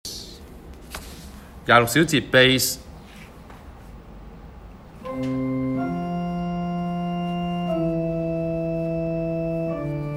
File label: Bass